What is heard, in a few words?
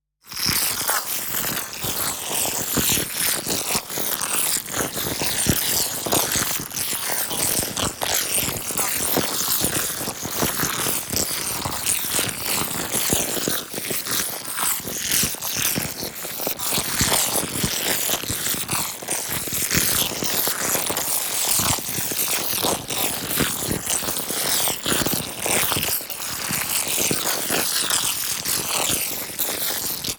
PARTICLES LARGE FAST Gritty Scrapes; NEON; boom library